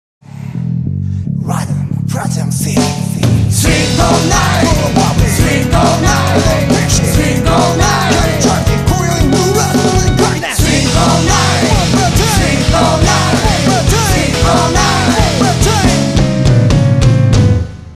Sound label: swing